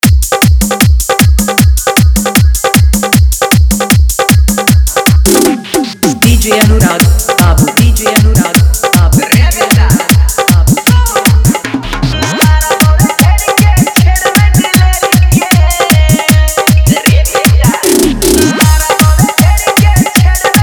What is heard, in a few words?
Dj Song Hard Dhollki Bass Mix